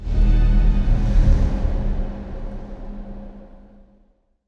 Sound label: Exploring Dusk End Stinger